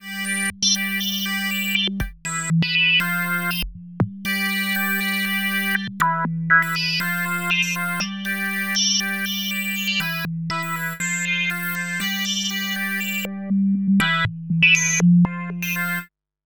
Ableton Wavetable Vowel Cadence

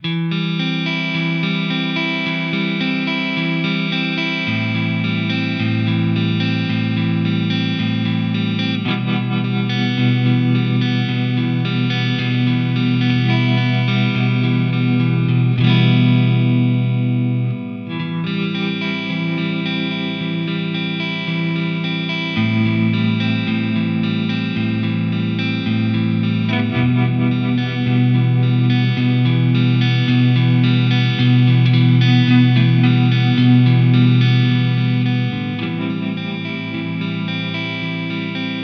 D'Angelico Bedford Deluxe Clean Neck

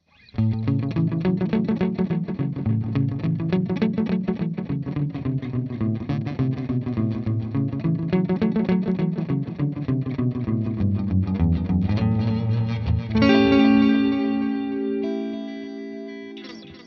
Slap direct

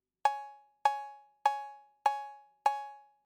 Cowbell

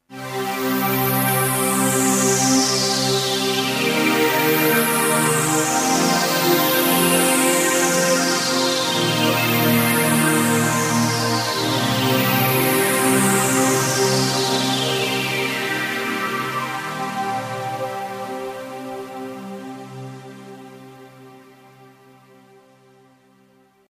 G2 Analog Pad

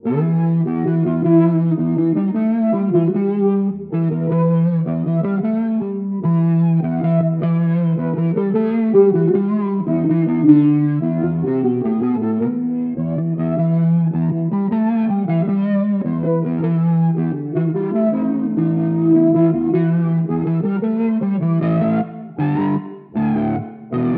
Mooer GE300 Lite Cali Amp Modulation

